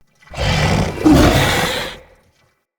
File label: Corrupted Battle Dog Attack Jump, Animals, Vocals